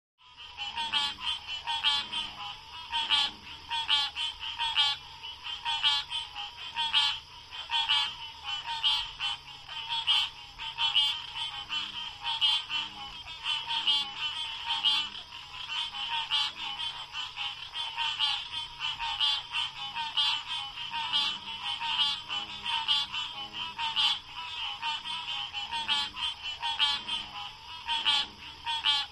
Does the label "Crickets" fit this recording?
Yes